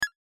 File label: Shot; Twee; Windows; Dagger Fels